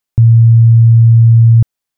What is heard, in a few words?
tom 115 hz